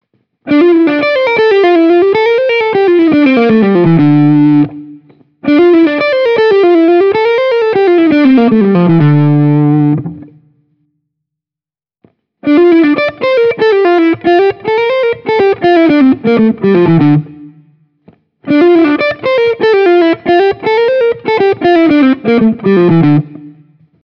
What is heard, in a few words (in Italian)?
Legato Re minore